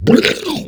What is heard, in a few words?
boss lite sloppy roar